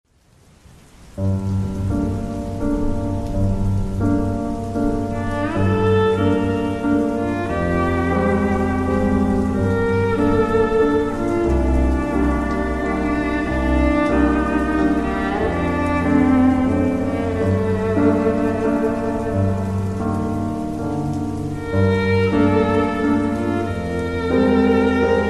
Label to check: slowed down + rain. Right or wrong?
Right